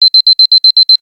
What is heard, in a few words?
music alarm; Sony Ericsson A8i; alarms; cmcc music alarm 1